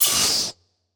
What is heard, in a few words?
lightsword blaster block; soundscrate